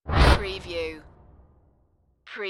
Wipe

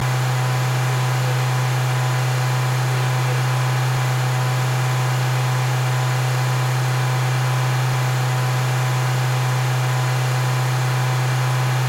Car Mazda 3 High Rpm Loop

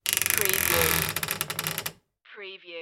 creaky wooden closet door

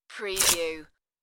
Paper Rip!

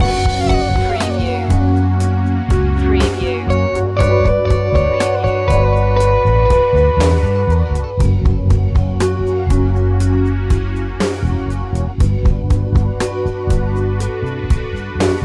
cheerful garden loop

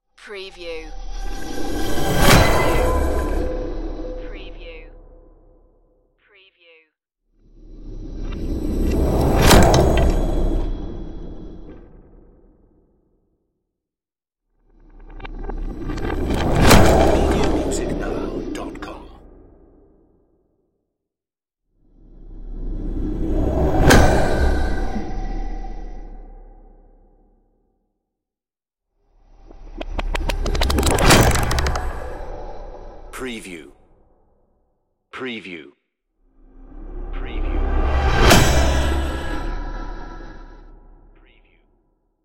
wormhole asteroid impacts

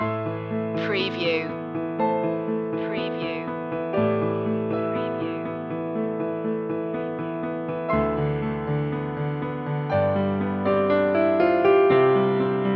piano solo

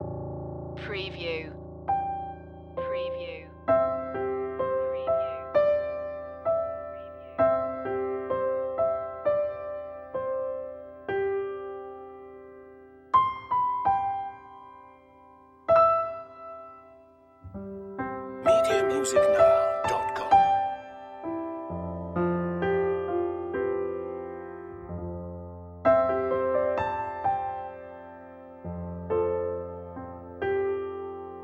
emotional piano cue

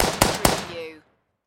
Machine Gun Vickers

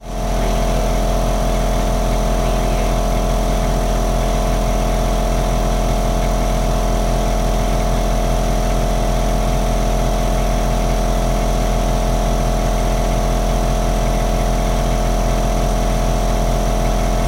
Tools air compressor; beanstalk audio

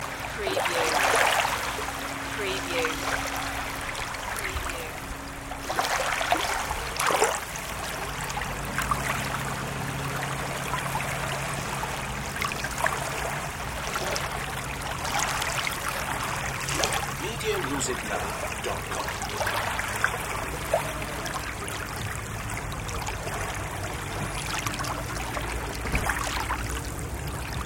Lake Waves

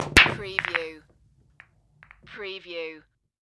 Billiards Break Ball; sound-effects-bible

